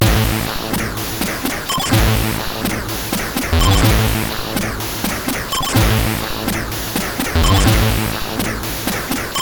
X-Music Remix, CSG8580

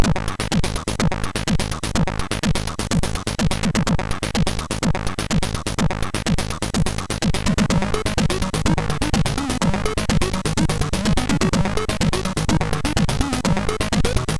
MOS 6581R3; HVSC; Goozboot 5

